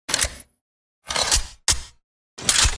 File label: mcx spear reload; weapons